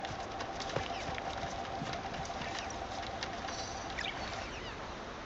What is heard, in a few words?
walking; kena bridge of spirits; rots